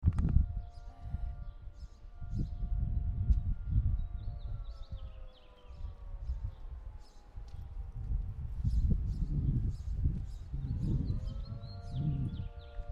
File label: WIND SIREN BIRD; HUDSON JORTEGA AUDIO